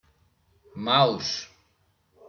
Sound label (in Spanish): raton